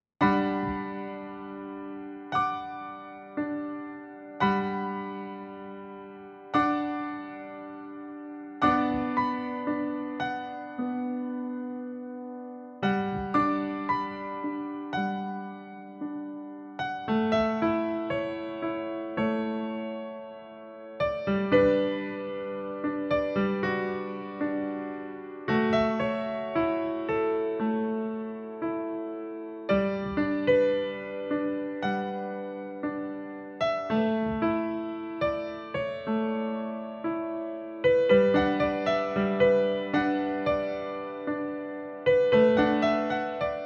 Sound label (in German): Entspannender Jazz